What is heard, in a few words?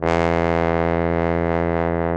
BARITON; Best Service; Alpine Volksmusik